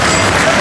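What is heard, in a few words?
amount gone; israel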